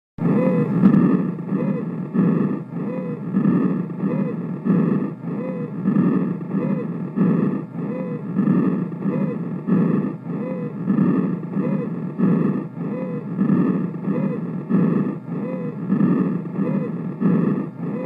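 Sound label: Wheezes mono; Weezes - mono; simulatorvzorky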